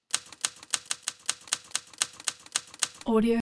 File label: evil typewriter; prosoundfx